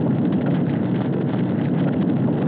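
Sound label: interior fire, Vehicle; NASCAR Thunder 2004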